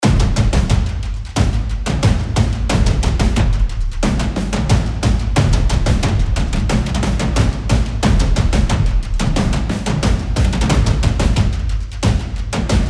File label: Cerberus Loop 4 4 Tight